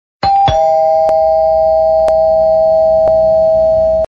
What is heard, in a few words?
Doorbell Msg Tone